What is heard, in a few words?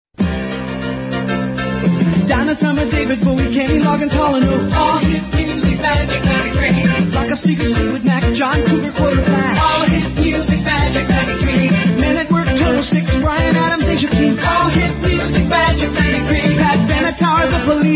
tv spot soundtrack jingle; kmgk